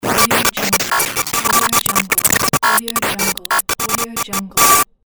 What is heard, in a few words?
glitch computer data